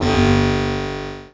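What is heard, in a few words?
Rotary Horn; Zero-G; Soundclash Nu Dance Electro; Acidized Wavs; FX Atmospheres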